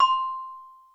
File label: SYN CELESTA, TONAL PERCS; Crypton - DrumBase5000